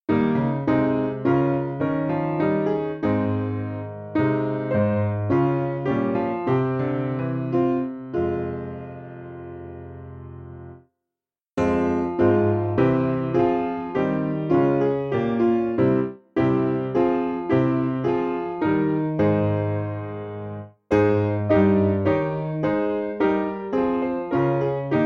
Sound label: piano, hymn